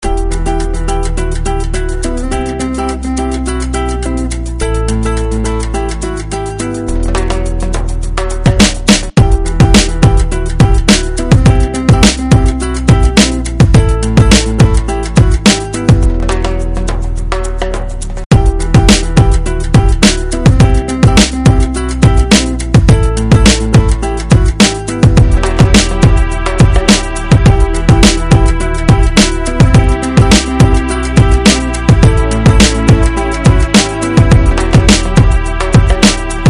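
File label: guitar instrumental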